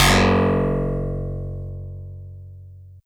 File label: Harpsi FM DX100 Fs1